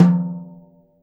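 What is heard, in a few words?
Jazz kit tom, Drums Acoustic; USB Soundscan vol.10; AKAI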